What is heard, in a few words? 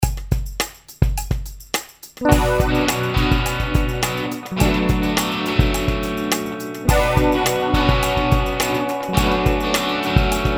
Tribal Line